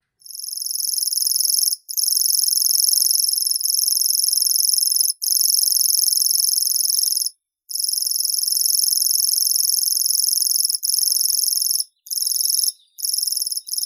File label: sverchok obiknovennii locustella naevia